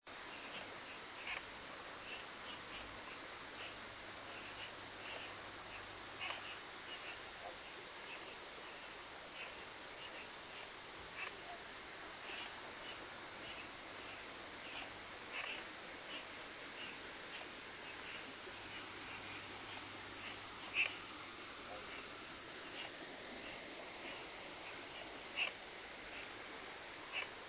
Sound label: Frog Night River